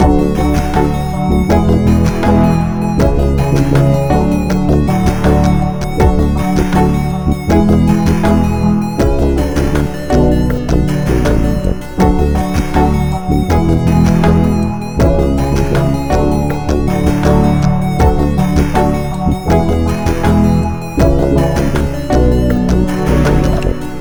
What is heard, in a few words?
stem bridge; Pix the Cat